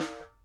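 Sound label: snare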